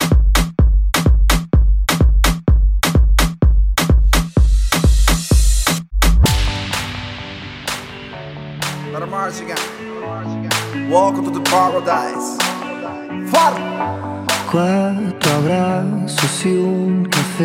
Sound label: AFRO BEAT